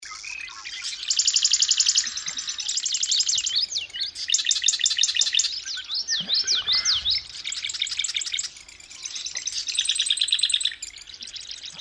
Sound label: Pothole Marsh, north dakota potholes